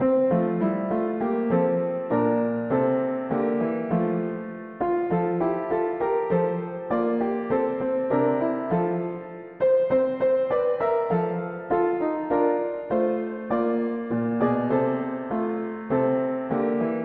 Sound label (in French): Rejette ton fardeau mono piano